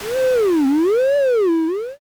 door creak open

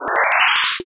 hikido

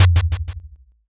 taiko

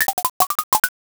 blocks collapse

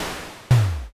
garbage

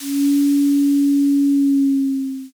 shakuhachi

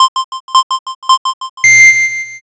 countdown

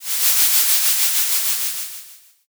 torch

